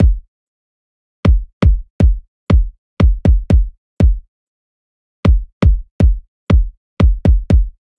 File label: Kick, Dancefloor Pop; Remixlive